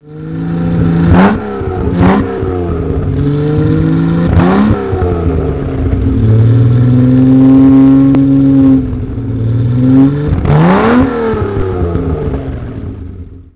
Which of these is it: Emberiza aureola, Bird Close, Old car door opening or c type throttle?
c type throttle